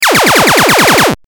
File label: full laser; sfxr